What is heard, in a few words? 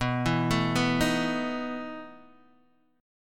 E Major 7th Suspended 4th, B